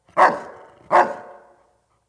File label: DOG, residential; zones